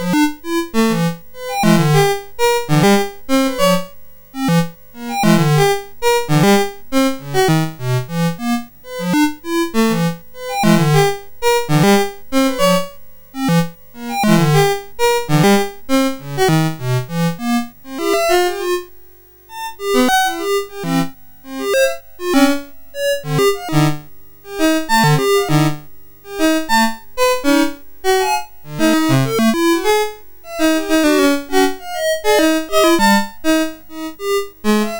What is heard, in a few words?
Atonal Music, 6581